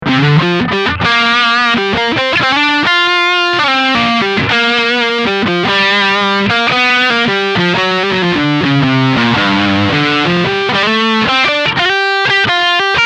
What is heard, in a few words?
Le Lead Kanal A full gain; two notes